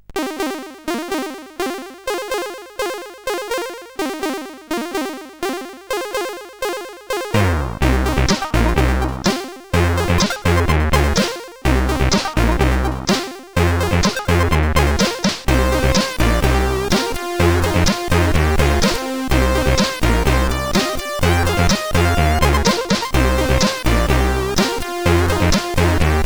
Stoned Rock, CSG8580R5

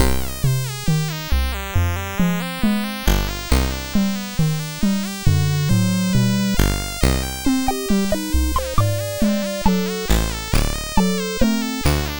Test Tie Notes, sid MOS6581R3; Driver 11

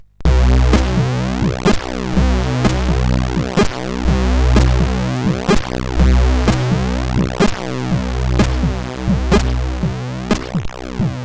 quick-step, sid CSG8580R5; Aztec

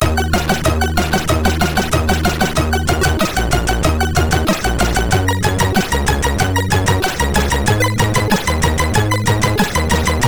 Music Box tune, MOS6581R4

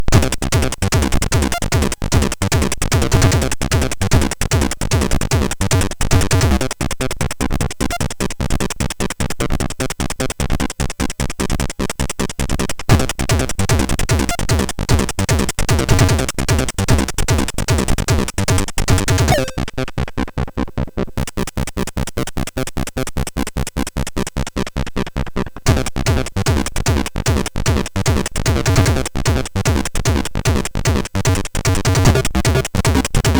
Techno Rock, sid MOS6581R3; Early Tunes

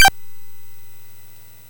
MOS6581R3, GAMES; Spikes Peak

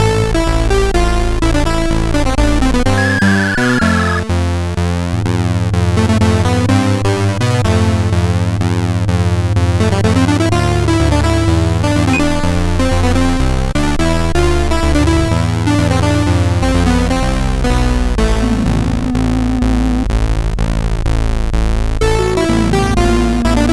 Rock, MOS6581R4

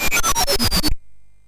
Final Synth Sample, MOS6581R3

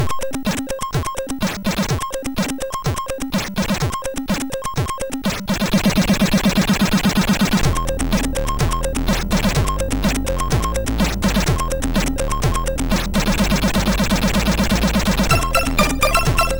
MOS6581R2, 8BitChiptune; FutureComposer 4 1 Loop

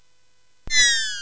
Dark Castle, CSG8580R5

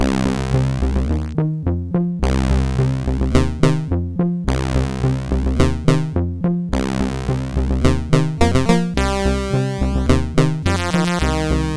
tape version MOS6581R4; sid

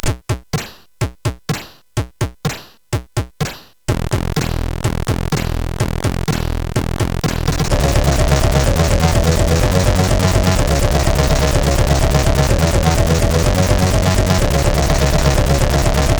Thrash Metal, CSG8580; hvsc

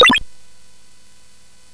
sid CSG8580R5, GAMES; Dragons of Flame

